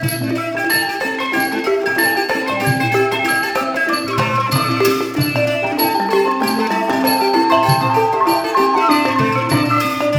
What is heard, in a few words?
96 THAI, THAI ORCH PH; Spectrasonics - Heart of Asia CD2